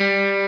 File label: Arp.